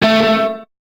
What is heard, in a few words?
Guitar Note